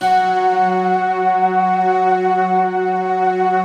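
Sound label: harpsichord, slow harpsiflute; Optical Media International; Sonic Images Library; Harp Synth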